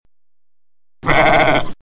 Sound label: lambs